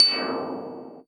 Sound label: Voyager Cymbal, Moog Voyager